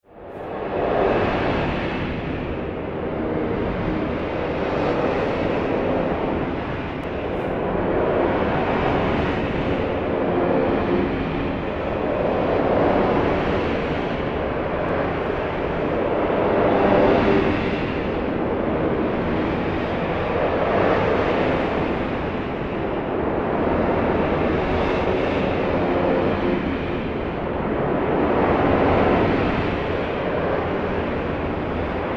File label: Soundscape Metal Waves, science fiction sound effects